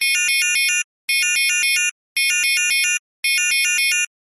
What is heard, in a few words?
science fiction alarm loop